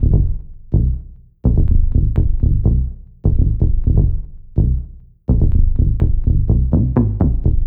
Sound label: Bass Line; Eclectic Electronica